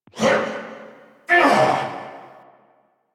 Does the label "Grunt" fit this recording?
Yes